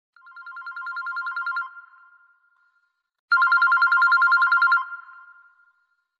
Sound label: Business Ring; KM555e Clubby